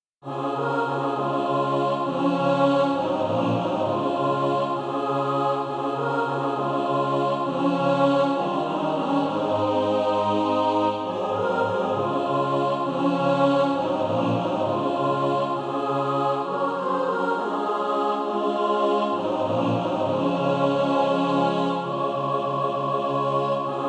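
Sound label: mixed, SATB Practice Music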